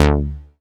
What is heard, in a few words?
Bass Multi TR D; Vengeance Samples